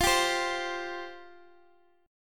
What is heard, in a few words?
F Suspended 2nd F Guitar Open G